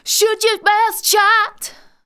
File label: vocals sung; Techno Trance Essentials